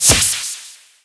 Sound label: Poof